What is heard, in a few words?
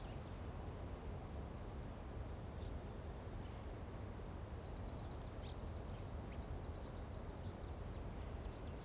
Window Bird; blue archive